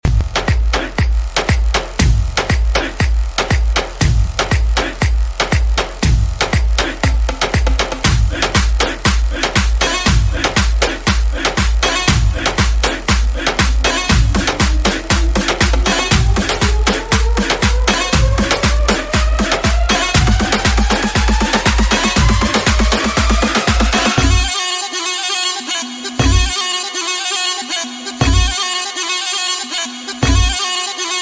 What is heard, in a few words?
remix kurdi bass; کردی ریمیکس